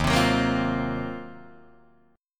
Eb Minor 6th Add 9th, down, Guitar, Drop D

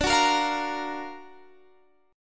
Gb Augmented Major 7th D

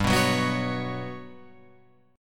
G Major Sharp 11th G down Guitar Open F; strummed; JGuitar

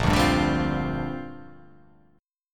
Bb 6th Add 9th Bb; Bb6add9 strummed

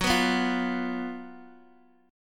F sharp Major 7th Suspended 4th, Guitar, DADGAD

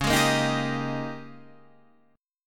G sharp Major 7th Suspended 2nd Suspended 4th; 9,11,8,8,8,11

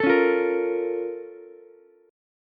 A sharp Minor Major 7th, down, Ukulele; A#mM7 strummed; JGuitar

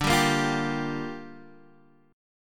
A sharp 6th, guitar chord

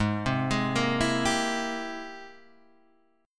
Ab Augmented Major 9th Ab Guitar Half Step Down

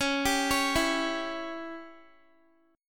C sharp Diminished C sharp, Guitar; arpeggiated; C#dim

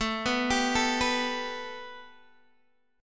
A 7th Suspended 2nd Guitar DADGAD; A7sus2; arpeggiated

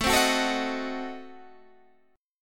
A Diminished 7th A, Guitar Standard; 17,15,16,14,16,14; strummed; JGuitar

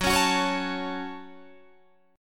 Gb Minor, Guitar, DADGAD; Gbm strummed

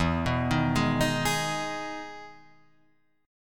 E Major 7th E down Guitar Open F; EM7 arpeggiated

